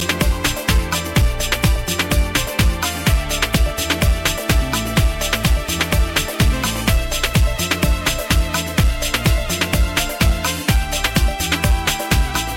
Inst

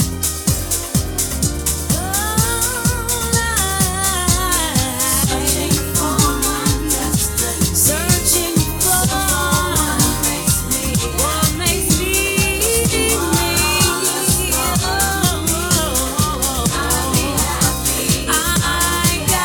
Vocal Mix

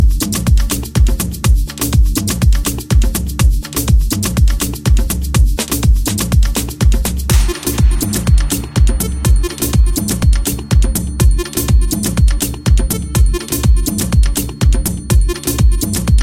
Drums

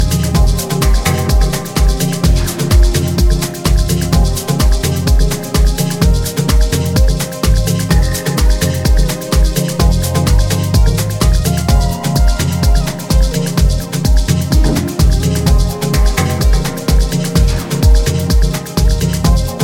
Rhodes Inst

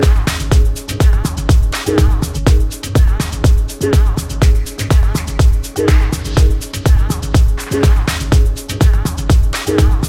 123 Bpm

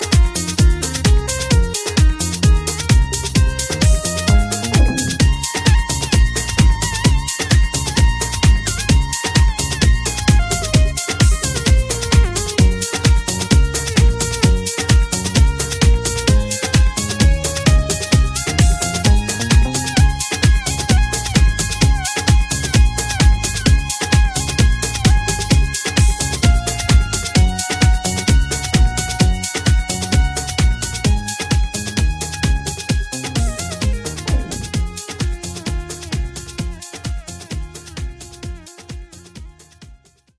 Inst

